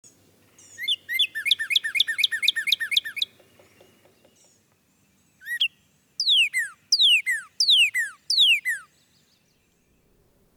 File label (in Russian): penie ptic krasnyj kardinal